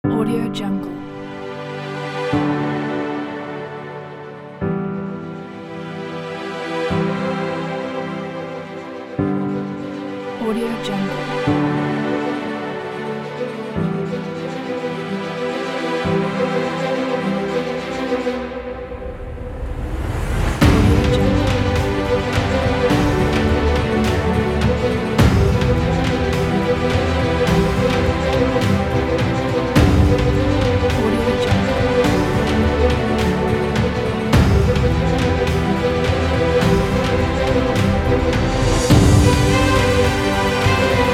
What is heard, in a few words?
the heroic; skull70